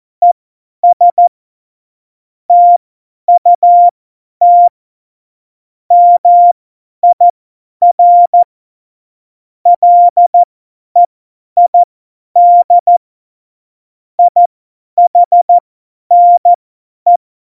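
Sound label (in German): Witz, cw morsen; Morsekurs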